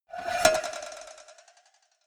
cowbell scratch hit move hard

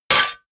sword parry metal

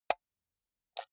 computer mouse right click slow hard

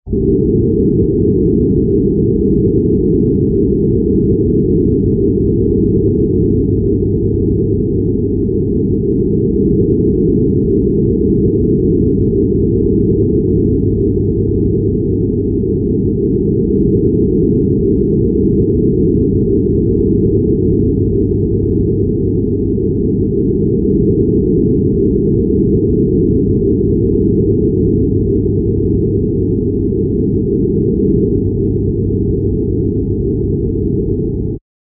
harmonic noise; sphera

